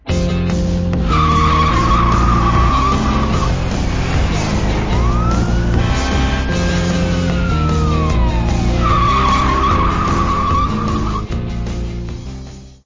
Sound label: Firetruck Tires Screeching Terrain Danger Dramatic Flourishes